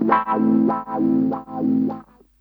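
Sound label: guitar; USB Soundscan vol.31; Miro/s Grooves; AKAI; DOC KIT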